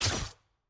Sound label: aah; pyke